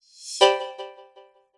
Basketball

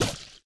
Jellyfish Mini Death

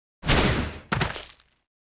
Fellwing Jump

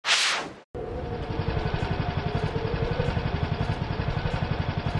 Aw; The Henry Stickmin Collection

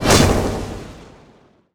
Spikes Shoot Up; Grimm Spikes Pt 2

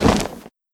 Barbarian Cloth

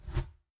woosh

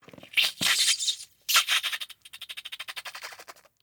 Kraken tent; Legend of Keepers (gamerip) (2019)

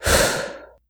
Voice Female Breath Blow; Legend of Keepers

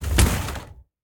Wood Punch

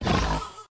Damage

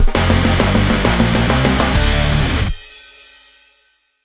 Success; Mars Sortie

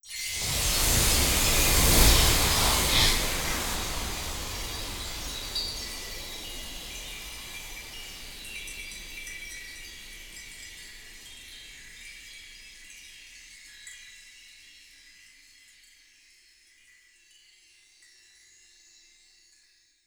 Chimes

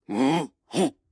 Ruins Great Shield Sentry Attack

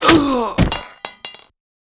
Paladin M Dead

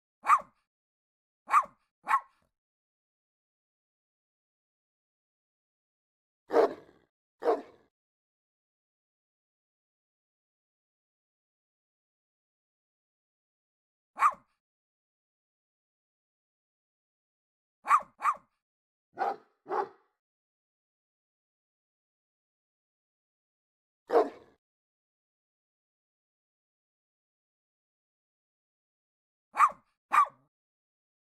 Dogsong More Barks

